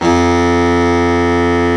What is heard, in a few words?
BARITON SAX